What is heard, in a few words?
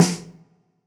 snare opaque normal mic normal shot